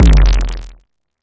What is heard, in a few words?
808 BD long, Roland-808 Drum Hits Bass Drum